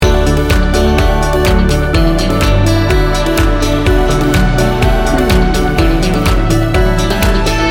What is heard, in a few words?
corporate team building loop